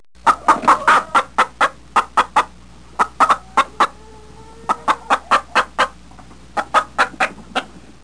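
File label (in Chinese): muji jiaosheng, hen clucking; jiachu jiaosheng; jiaqing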